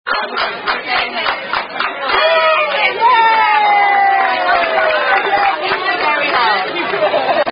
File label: Birthday, Happy Happy Birthday